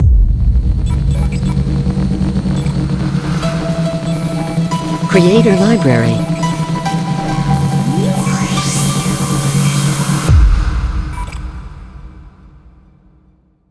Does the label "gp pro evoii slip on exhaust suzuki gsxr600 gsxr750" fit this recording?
No